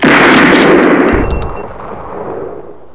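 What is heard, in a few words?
shotgun; gunslinger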